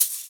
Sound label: Shaker; Equipped Music; Loungin House